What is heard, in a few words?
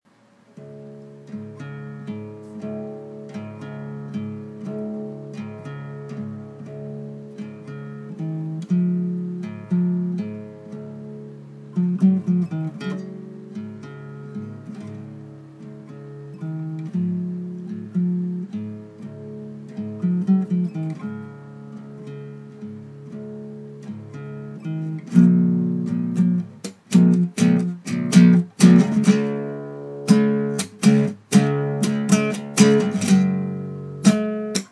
a late night guitar doodle